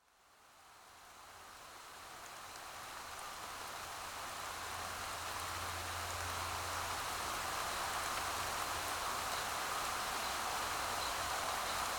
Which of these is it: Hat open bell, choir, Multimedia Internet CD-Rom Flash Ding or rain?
rain